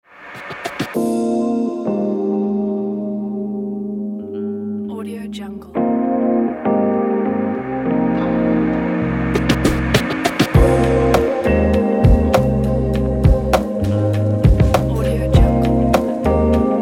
lounge chilling ambient; soundmount

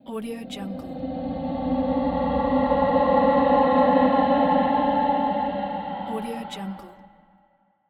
mysterious vanishing sound

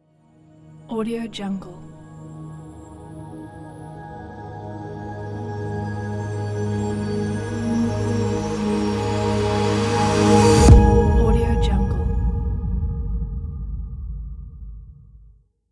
atmospheric intro

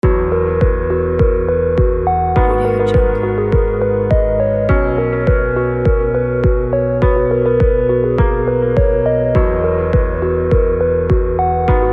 soft interview background

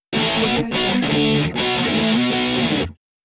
rock guitar finale rhythm; hollywoodedge

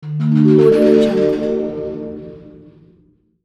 retro welcome screen

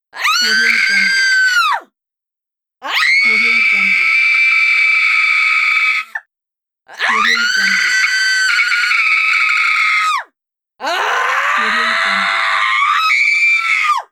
female screams

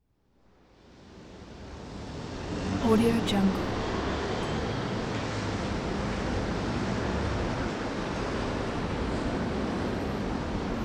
london traffic near victoria station; prosoundfx

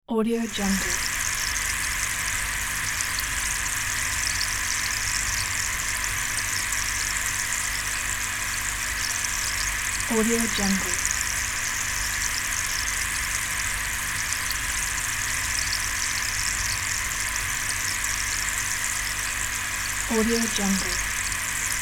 aquarium bubbles pump; sound ideas

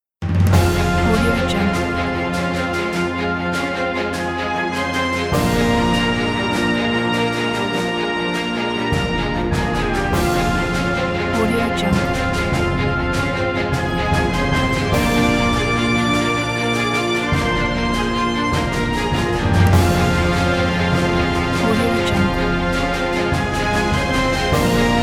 super hero ident; davidyan

